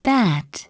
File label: Bat